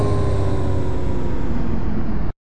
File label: hover stop; ufoai